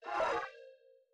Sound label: aquarium boss voice Combo Warning; enemies